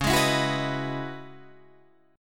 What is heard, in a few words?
C sharp Minor 11th C sharp, Guitar DADGAD